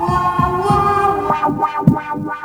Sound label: samba